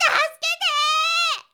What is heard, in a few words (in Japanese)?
shonen himei